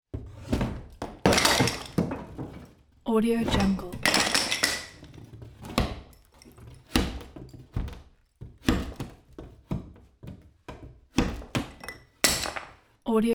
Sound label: ripping tile from wall